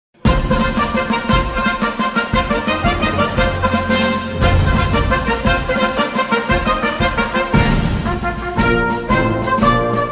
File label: Anthem; Barian Kingdom